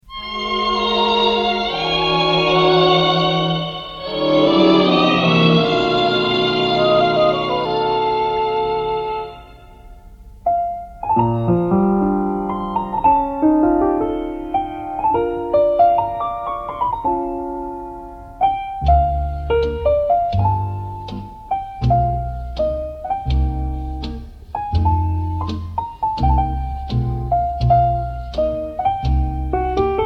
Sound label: Orch